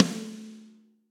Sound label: Snare Rim Studio Basic; Drum Hits; Standard Hits; Ableton; Factory Packs; Session Drums Studio